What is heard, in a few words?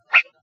Fast Whistle